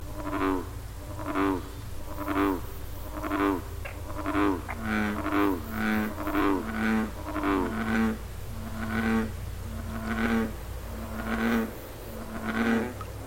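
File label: bullfrog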